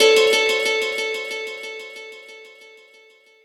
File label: guitar